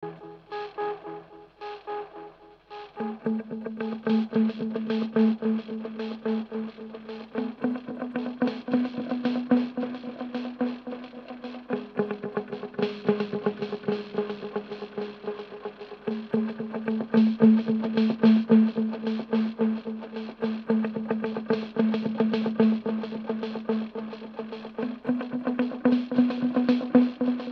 Delay

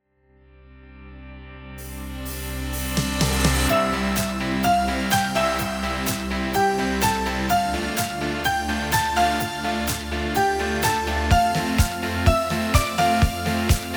Yamaha Genos2 Track Schlager

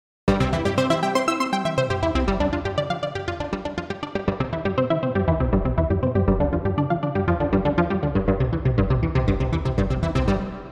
Synthesizer WLA Parking Garage

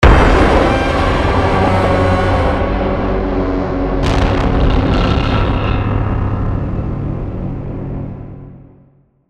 Impacts Breakout; Gravity 2

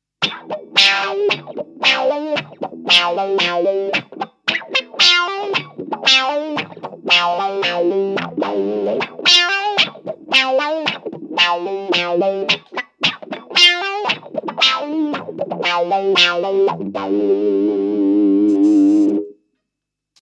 Auto Wah

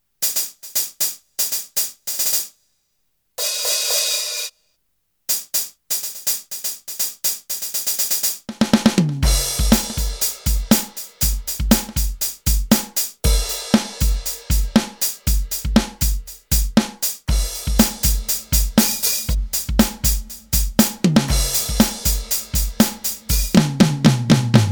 Behringer XD8USB 808 HiHat Response

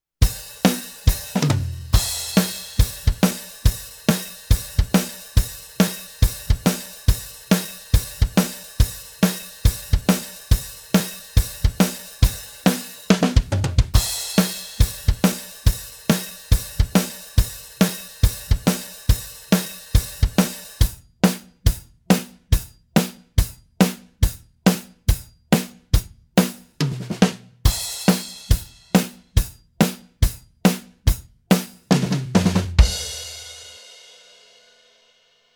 Korg KR 55 Pro Rock

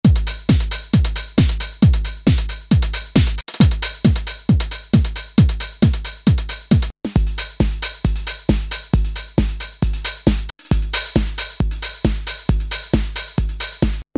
Elektro; RMIV

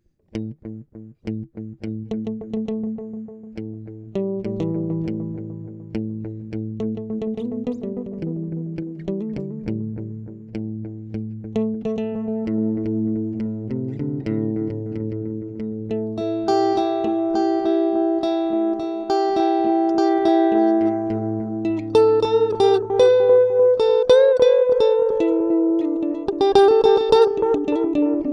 DM 2w Custom Mode

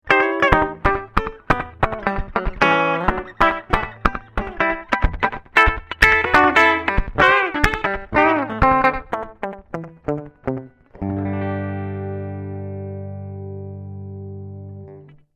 Tape Echo

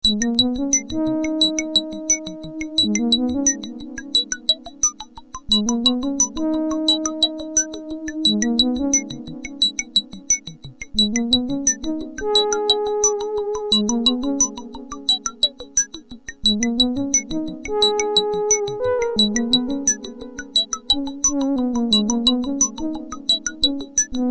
arp2600 juno60

